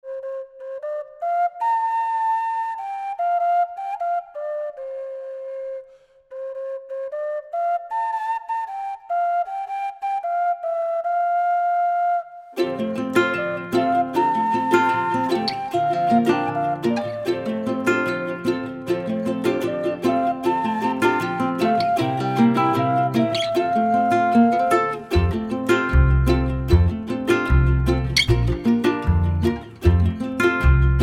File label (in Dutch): karaoke